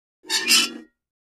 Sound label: Metal Scrape On Metal